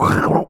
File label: squid death; Minetest; VoxeLibre; mobs mc